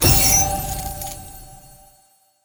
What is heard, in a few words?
Sound Effect - Get Resources